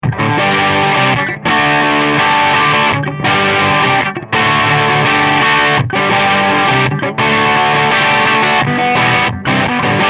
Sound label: EL84 Crunch